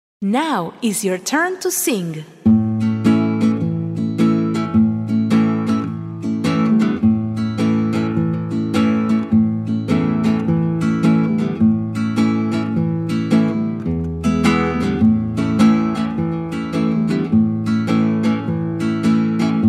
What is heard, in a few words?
Row row row KARAOKE